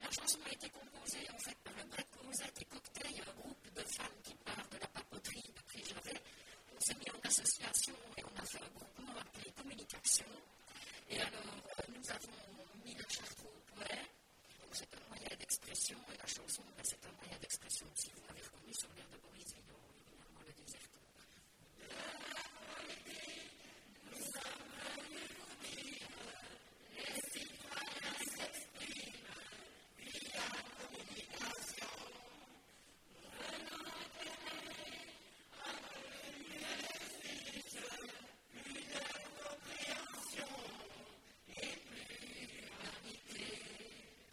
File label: chant; verviers